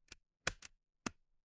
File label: office stapler